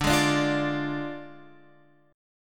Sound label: D Diminished Guitar Open F, chord; x,9,8,9,8,9; Ddim strummed